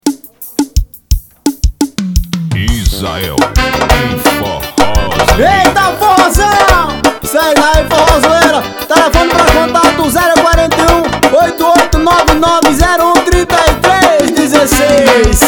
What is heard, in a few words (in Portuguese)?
passagem de som